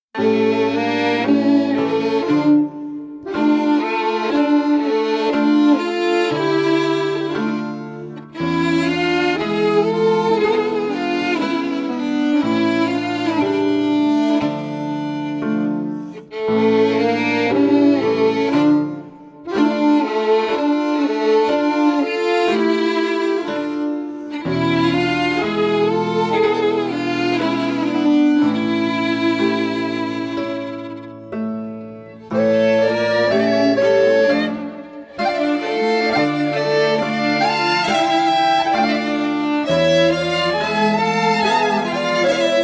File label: Swing, Hora